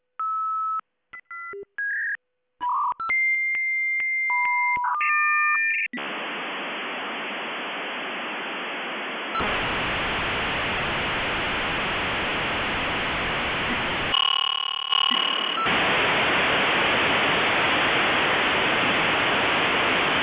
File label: Agere SV92 QC